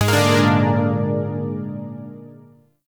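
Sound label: Shawzin Minor Chord; Chords; Warframe; Zariman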